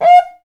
PRC XCUICA, Skin Drums

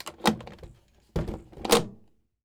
SUITCASE, Tools; AKAI S6000 CD-ROM - Volume 6

